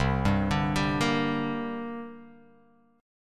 C 7th Suspended 2nd C down Guitar Open F; C7sus2 arpeggiated; JGuitar

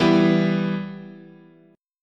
E Minor, chord

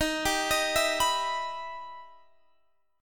E Minor Major 7th D sharp

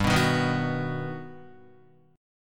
G Augmented Major 7th, Guitar

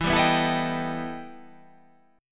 E Minor Major 11th E

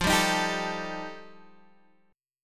G 7th Suspended 2nd Sharp 5th F

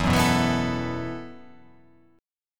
E Suspended 2nd Suspended 4th E, down

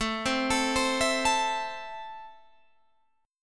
A Minor, Guitar, Open G

